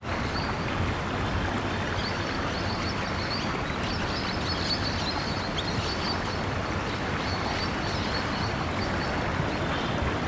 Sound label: lake, top bridge; Coburg Lake 2026